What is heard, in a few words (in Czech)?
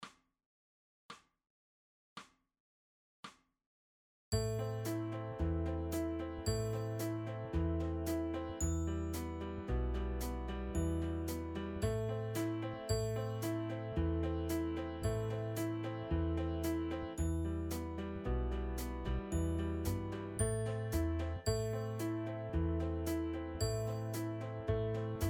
Kytara tulacky rano; Kytara vyuka